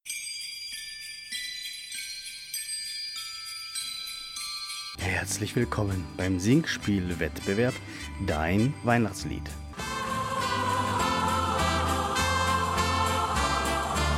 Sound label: Jingle Bells; xmas